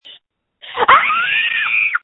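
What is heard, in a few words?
Scream, phone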